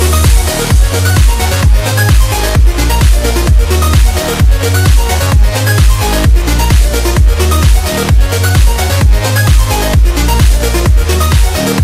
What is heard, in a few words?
bonus level, ringtone